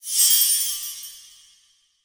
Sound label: sparkle; smite; chiron